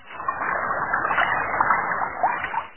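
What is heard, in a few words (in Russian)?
течение нежный мягкий спокойный приглушенный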